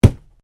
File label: PUNCH